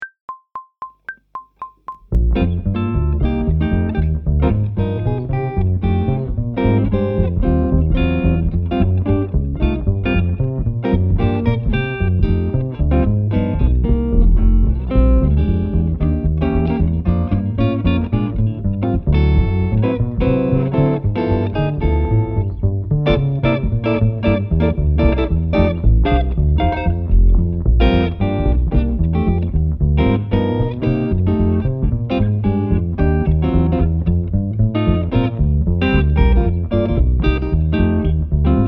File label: Fast Swing 225bpm, Brushes; Phrasing and Freedom with Brushes